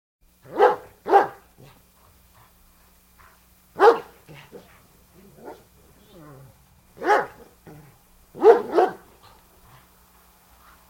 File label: dog; Zomboid 41.78.16; Project Zomboid